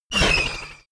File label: sci-fi laser shot down; soundpool